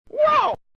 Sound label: WOAH